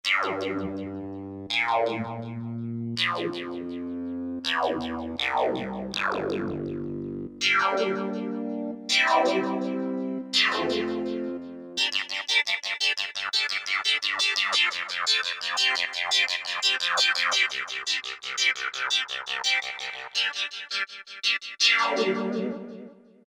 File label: Flange